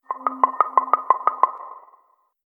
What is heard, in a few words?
Organic, ringtones